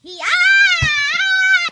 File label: yaaaahaaahaaah